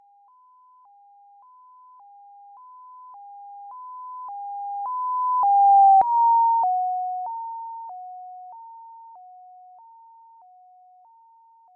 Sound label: ambulance